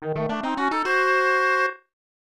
Tada; Heisei Shin Onigashima; snes

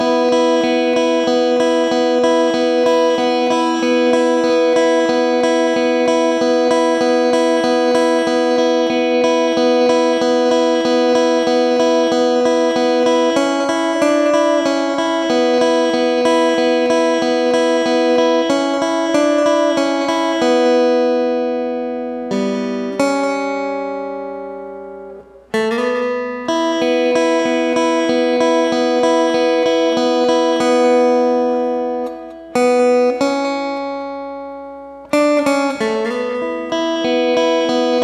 Camp Approach Guitar; Firewatch

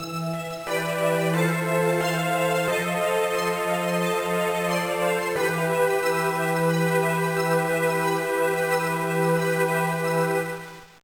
Jingle; Ghost Trap; GBA

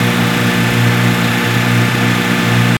Vaz Priora High V4; car simulator 2

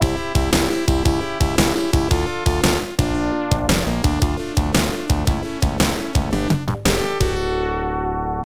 Dullard (Continue); Zero Wing; genesis gamerip

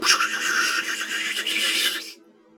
rocket shot

